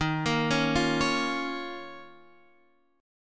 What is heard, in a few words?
D sharp 7th Suspended 2nd, Guitar, Half Step Down